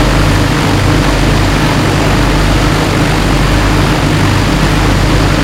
blenheim rear, engines; War Thunder

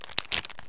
bandage open; Escape from Tarkov

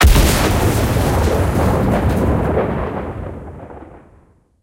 plane explosion; War Thunder

